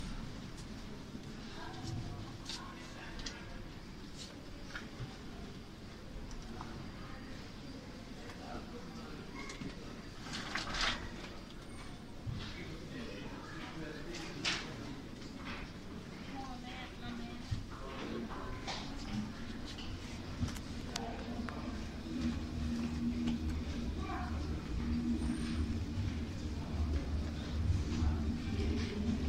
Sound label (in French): ambiance, Metro